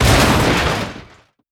Car Damage Medium; gamerip; Car Simulator 2; android

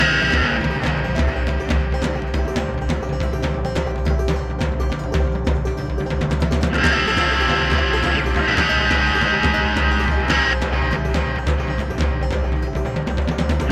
Aggro Agg loop no bas no lead; Outdoors Old

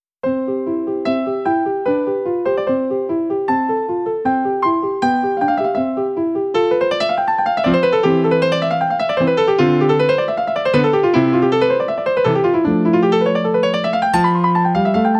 piano concours type a

